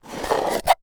Wood Box Opening Box; foley

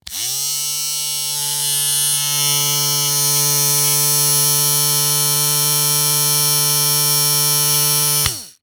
Industry Tool Motor Run Small

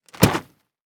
Impact Treasure Chest Drop On Rock Salt; Impacts

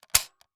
Foley Toy Gun Fire Shotgun

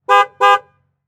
Truck Envoy Honk Horn Multiple; transportation

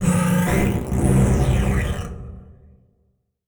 Title Transition Communication Distortion Jammer; Production Element Title Transition

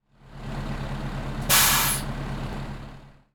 Air Brakes From Fire Truck; transportation

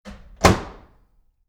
Household Oven Door Close

